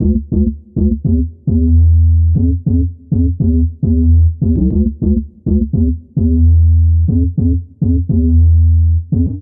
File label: Deep FM Bounce D Minor 102 bpm; Bassline; Tonal; MIDI Clips; Drive and Glow; Ableton; Factory Packs